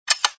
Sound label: Oven Button Press